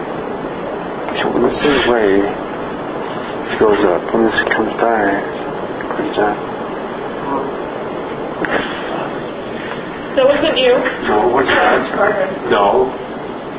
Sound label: breath in ear; Homer Mill 2009